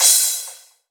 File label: Crash, Cymbals